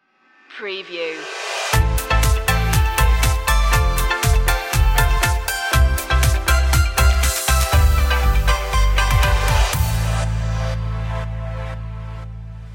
summer beach, pop, electropop

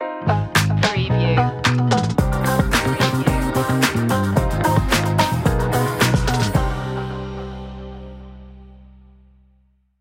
glam style stinger, urban rnb hip hop

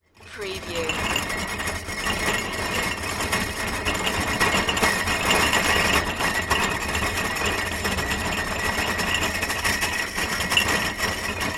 rolling trolley table; tremor; the sound pack tree

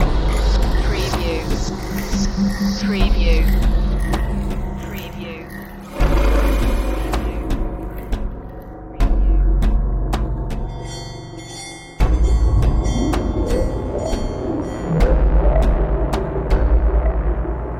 loop; jungle

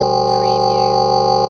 Space Servo Motor; Sound Effects Bible

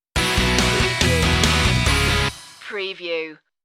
stinger; rock; moderate

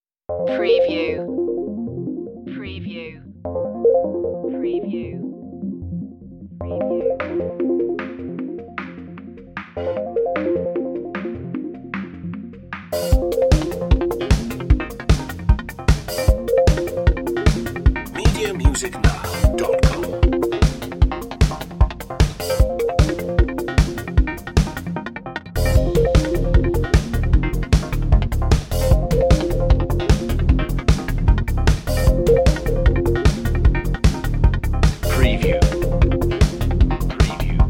underscore, Ambient Rhythmic Textures

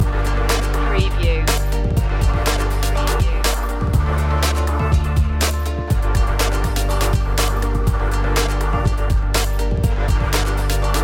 street crew loop, urban rnb hip hop

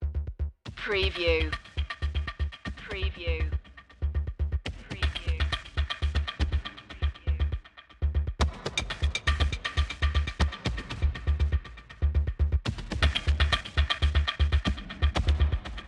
drums and bass; ambient; dark atmospheric

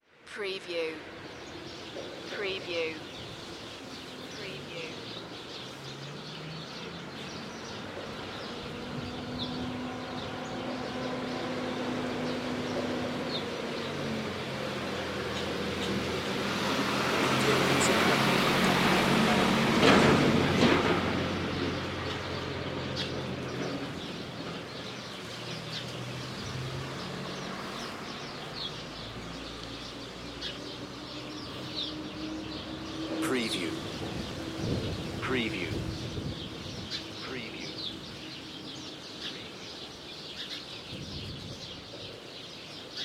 Country Road Truck Drive By; nature sounds ambiences; lynne-publishing

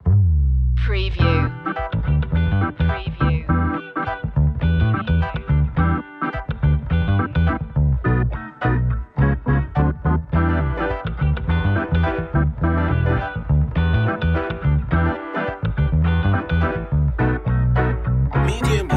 curious cat no drums, funky groovy

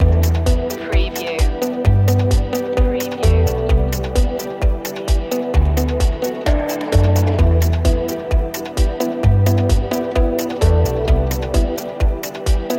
airscape loop, ethereal

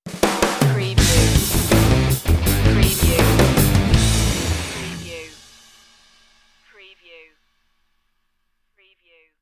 slam dunk stinger; adrenaline

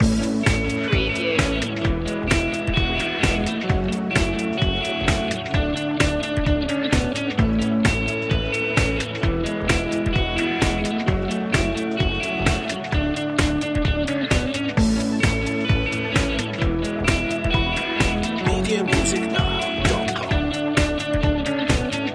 start up loop, pop rock

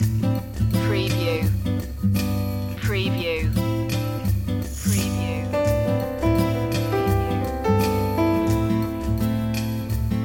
loop; pleasing

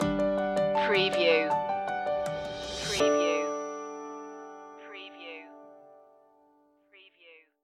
Music stinger; fall season; angst-ridden

